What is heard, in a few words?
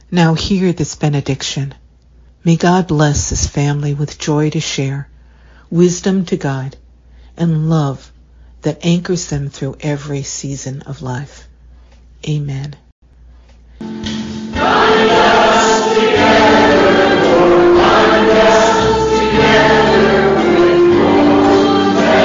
Benediction and Closing Chorus